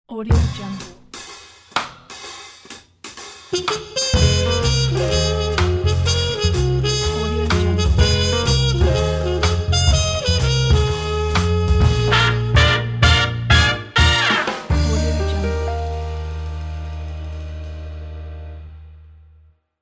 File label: jazz logo